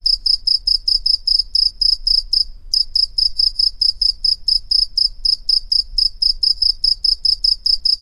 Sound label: cricket chirping